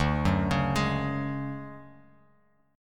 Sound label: D-Major Sharp 11th, D, Bass Standard; arpeggiated; JGuitar